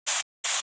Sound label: Graffiti